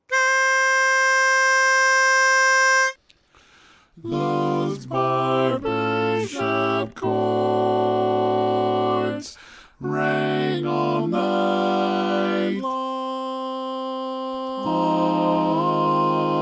Tenor; Barbershop Tags

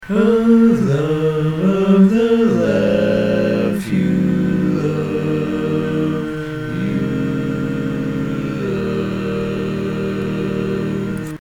All Parts, barbershop tags

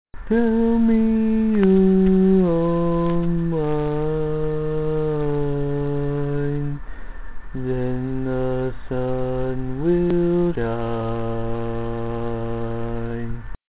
Bass, barbershop

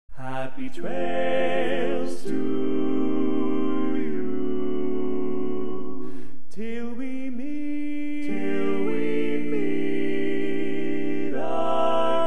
Tenor, barbershop tags

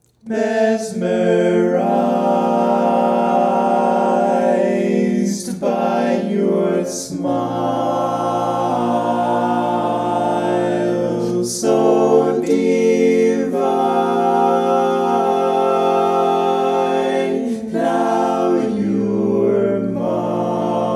Lead, barbershop

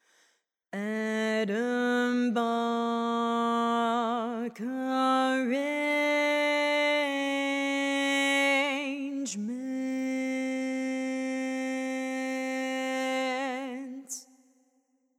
Bari, barbershop tags

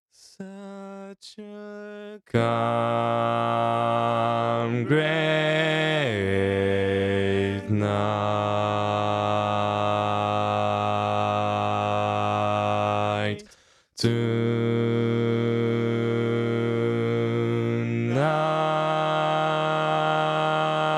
bass

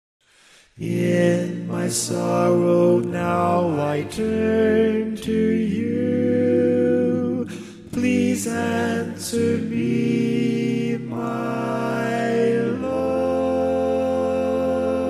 Bari, barbershop tags

